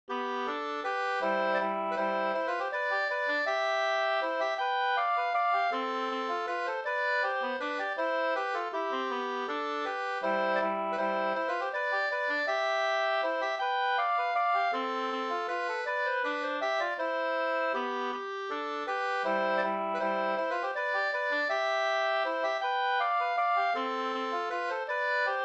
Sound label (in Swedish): vals; Karl Svensk; Osterby bruk